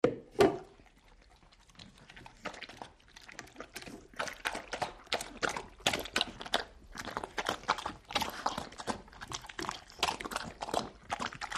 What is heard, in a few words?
Dog Eating Noisily Bo